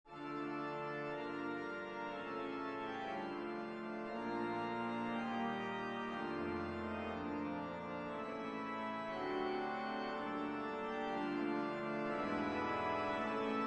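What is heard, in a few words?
prelude organ transcription for church service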